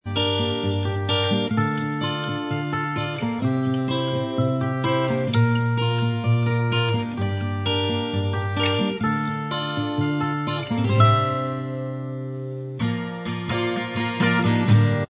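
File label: acoustic Instrumental Track, backing tracks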